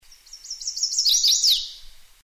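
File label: Chestnut-sided Warbler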